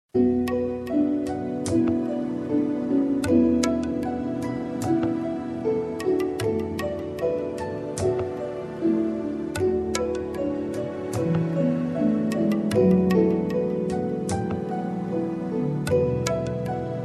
ambient with nature; jest tylko ta chwila

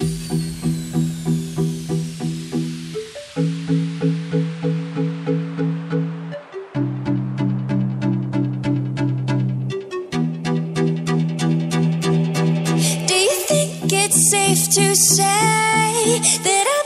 singing power music 432 HZ; ITS OK

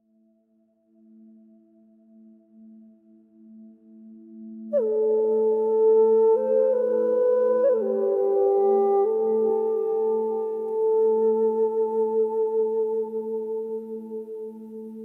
Acoustic Zephyr; Whispering Winds

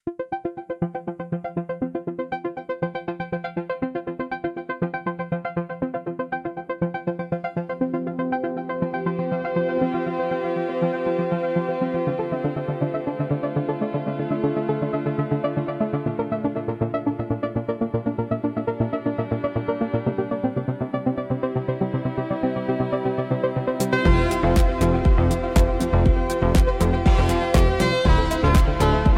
Electronic Impulse

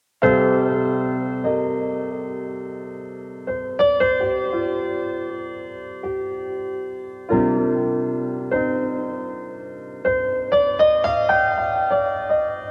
piano music, 432 Hz

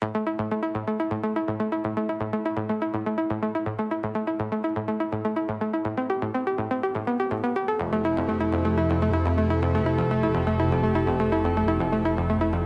Electronic Impulse

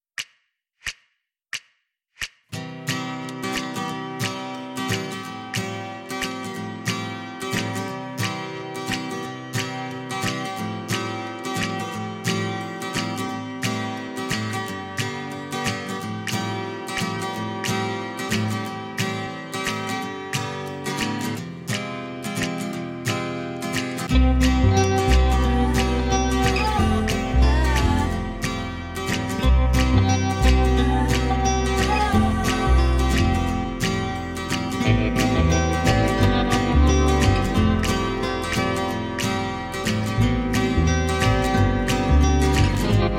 acoustic vibes, 432 Hz

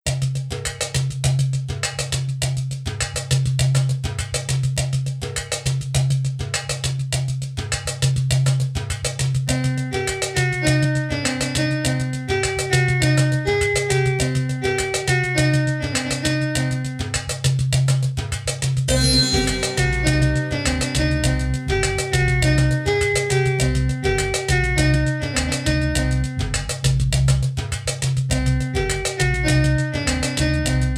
sensual rhythm, moving fast, 432 Hz; Poruszając się szybko

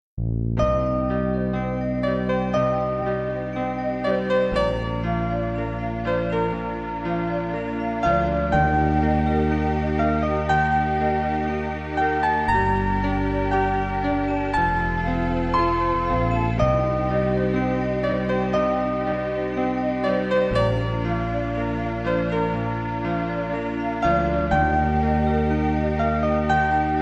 spa music, 432 Hz

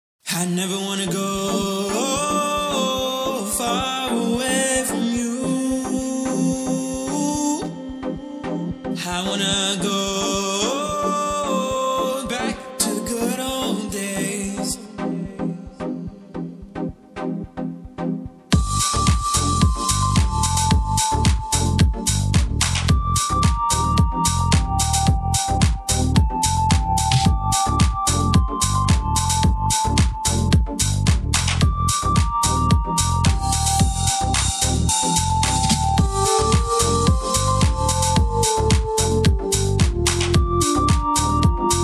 Funky House Vibes

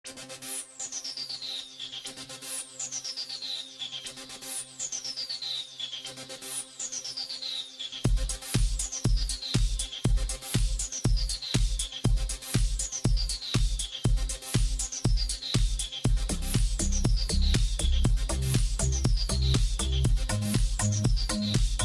music dance

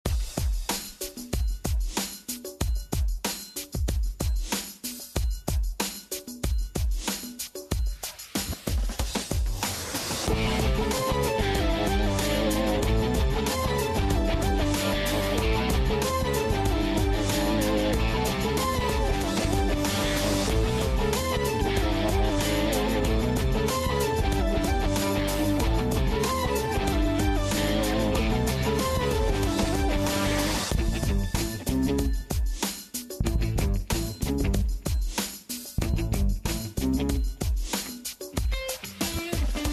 music dance elektro; elektro feeling

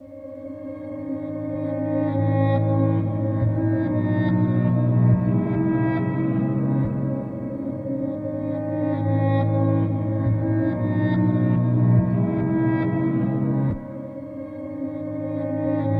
chilling funk 432 hz

